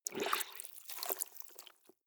clothes water wring soft, haushalt; haus garten

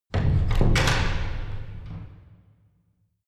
church door

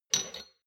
shot glasses pull out slide medium, gastronomie; berufe; soundpool

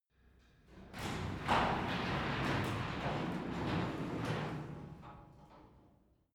garage door open interior

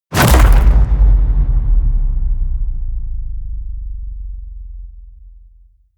hit wood, hits impacts

